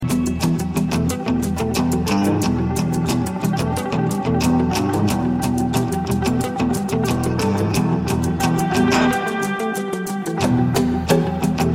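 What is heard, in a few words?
background music ringtone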